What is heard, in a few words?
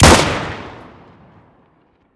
elite fire